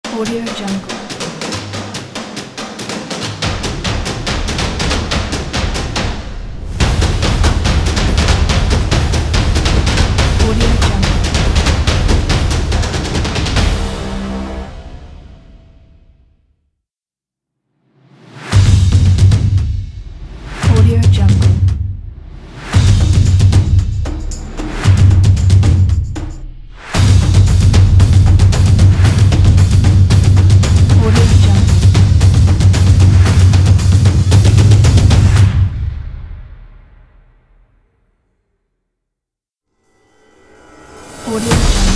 drums logo pack

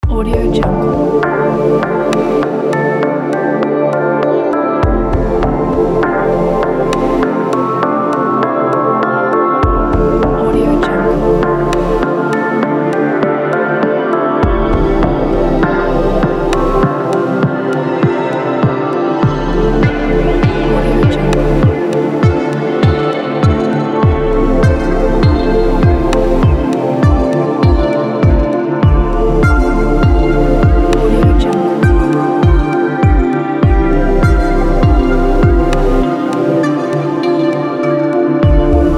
electronic new age

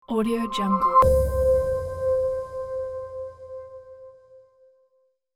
ambient inspiring logo

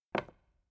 dice felt covered wooden gambling table

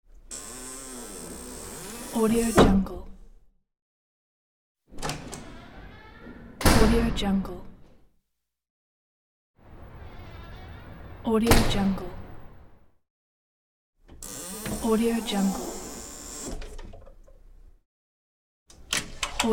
door foley sounds

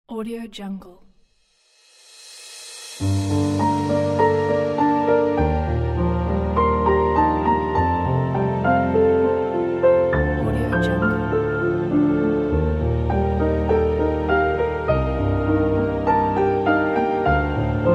cinematic sadness piano and strings

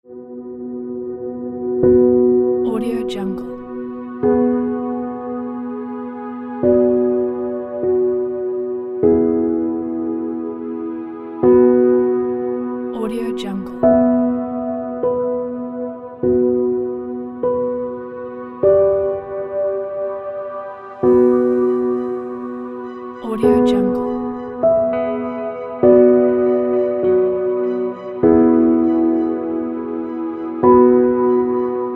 nature cinematic

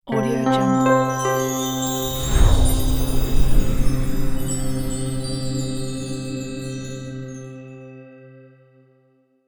short chapter intro stinger; fxprosound

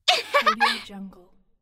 girl laugh

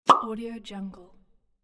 pop

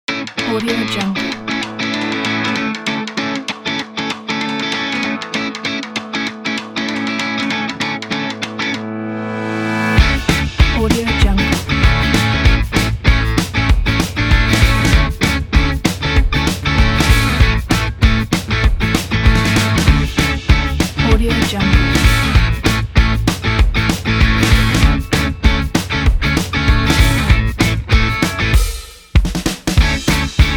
upbeat happy kids rock

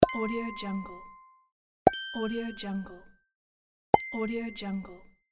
pop up ding

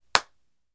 hand clap single hits

